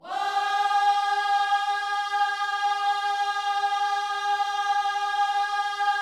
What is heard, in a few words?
WHOA G#4, Vocal Planet Gospel; Spectrasonics; Vocal Planet Vol.1 Gospel; akai